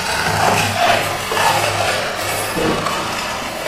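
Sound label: metal tile scrape loop, physics debris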